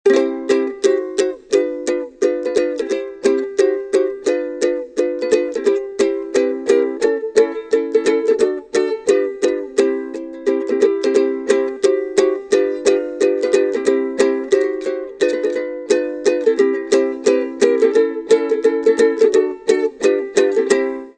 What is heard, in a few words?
ukulele